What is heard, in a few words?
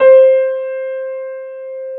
piano